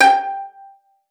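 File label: violin pizzicato G5; Xsample VOL-5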